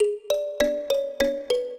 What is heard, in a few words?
minuet, mbira; Mozart